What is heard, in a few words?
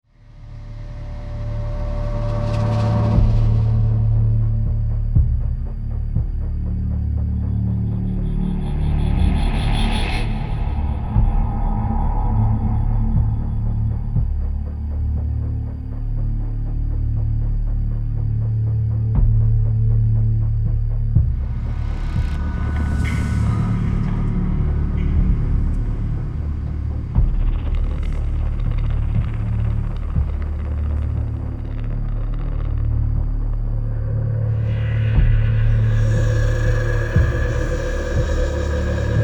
Tension Dark Scared Crazy; Naked And Afraid